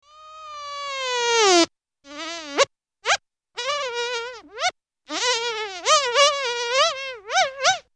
fly buzz, insekter

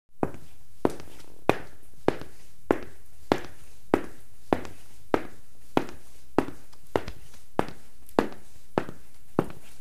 steps wood, FOTSTEG INNE

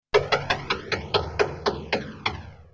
lotto wheel, NÖJESFÄLT LOTTERIHJUL

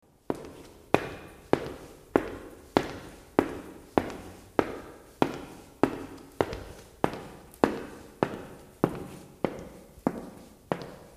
steps hall; FOTSTEG; INNE

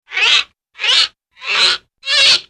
cartoon squeek; TECKNAT

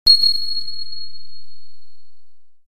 cartoon pling; TECKNAT

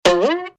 cartoon twang; TECKNAT

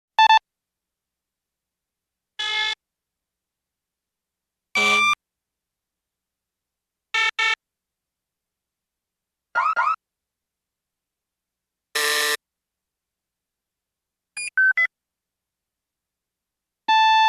noise alarm

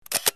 Clic; KNAPPAR; FJÄDERLJUD

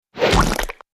impact splat; BRYTLJUD; FUKTIGA

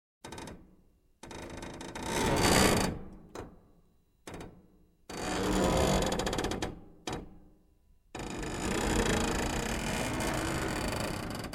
creak metal; METALL; KNARR

